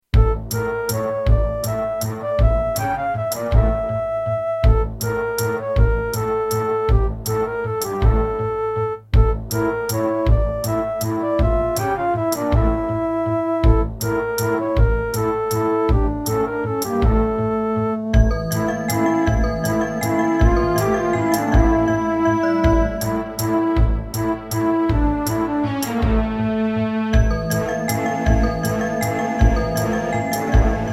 Turtle Beach Maui

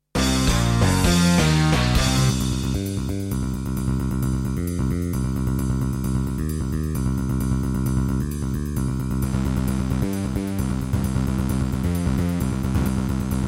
Roland SC-8820; sigil doom episode 5

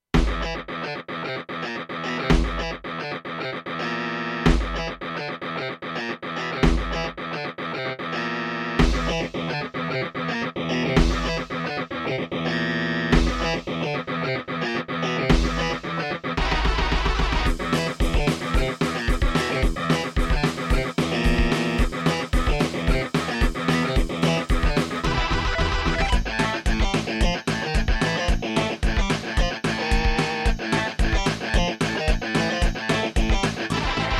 Ensoniq VIVO 90DB-A; Doom

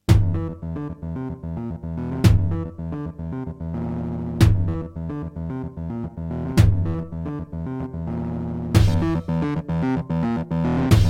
Serdaco McFly; Doom

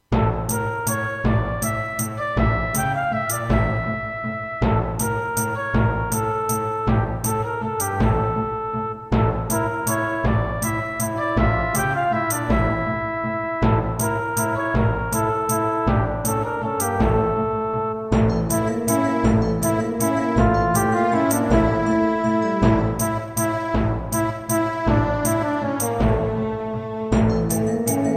Roland SC-7

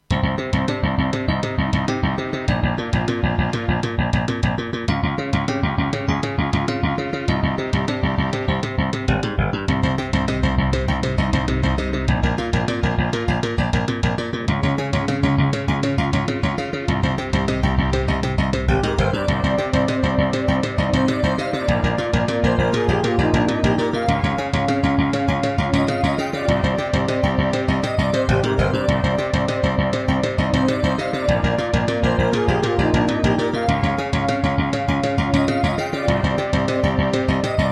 Hammond GM-1000; Hocus Pocus

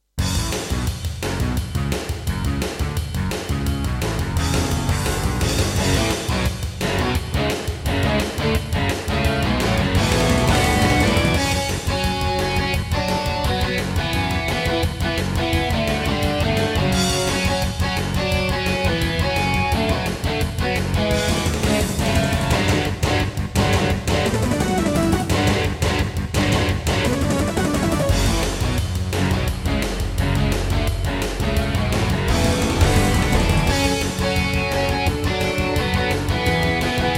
Intro, Roland SC-88 Pro; Duke Nukem 3D

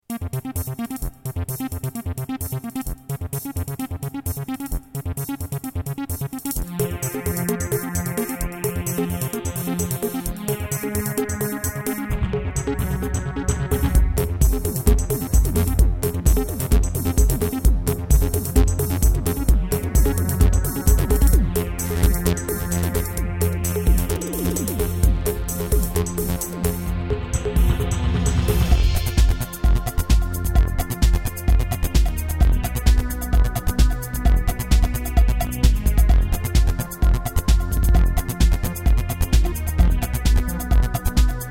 Terratec MiniWaveSystem WT64; Descent; Level 1

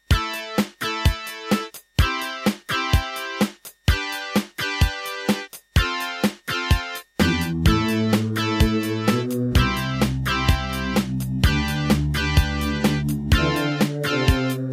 Diamond Monster Sound 2MB; raptor call of the shadows

